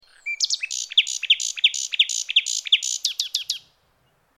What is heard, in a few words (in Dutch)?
nachtegaal; ringtone